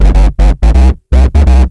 BASS SYNT; Big Beat Samples & More

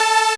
SYN SYNTH, dance synth; Sound & Vision - Gigapack I CD 2

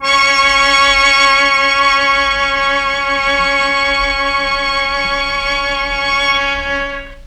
MEL STR, Legendary M400

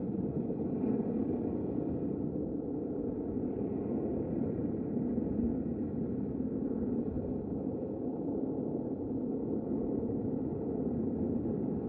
Ambience Loop, outdoor

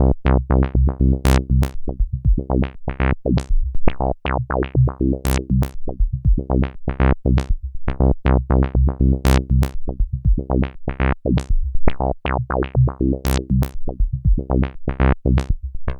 Bassline, Prototechno

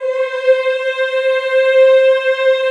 CHO C4, Eeh; Eeh + Ehh

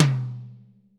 TOM-TOM-L, ACOUSTIC KIT; Greytsounds VOL-1

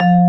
VIBE

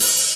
HH, Dance Mega Drums; Best Service; HH-HIP

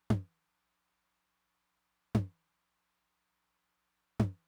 high tom, Roland TR-909; Roland TR-909 Ableton Project

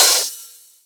Open HH, Cymbals

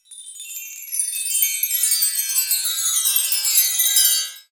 Orch perc; Orch Perc and Stings; cartoon; musicradar; cartoon caper

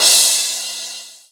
Crash, Cymbals

